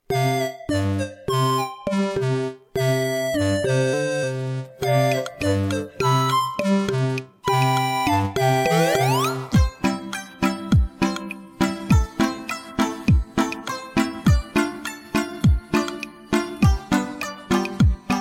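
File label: backing track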